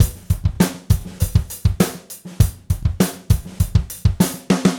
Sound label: Gretsch Groove 100, 1967 Gretsch Kit, 100 BPM; Sonic Foundry (Sony Creative Software); Vital Drums